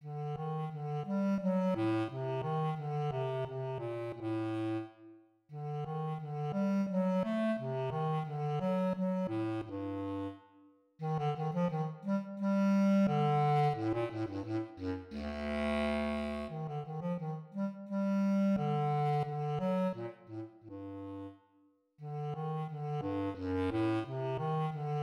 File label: Bass Clarinet